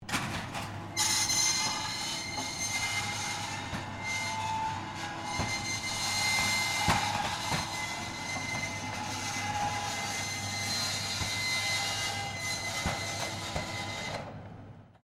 Door Rollerdoor Medium Supermarket Opening Stereo, Medium sized internal roller